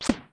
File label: stomp; smw2